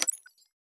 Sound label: Button; PCBS; Aorus